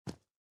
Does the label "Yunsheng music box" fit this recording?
No